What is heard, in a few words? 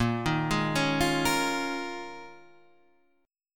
Eb 9th Suspended 4th